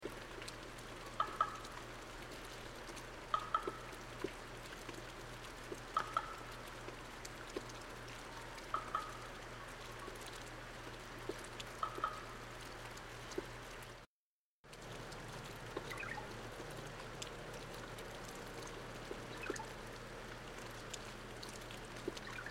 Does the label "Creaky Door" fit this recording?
No